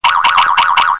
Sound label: boink; How Many Bugs in a Box